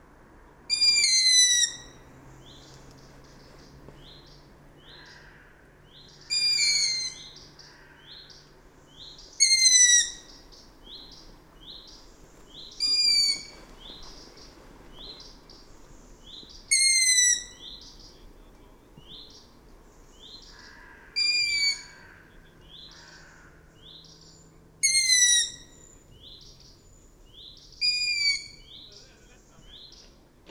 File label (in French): Hibou moyen duc Asio otus; carnet nature ete